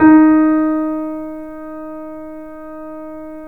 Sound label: D#, The Piano; InVision Interactive